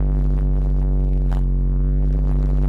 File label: Electrical Noise; layering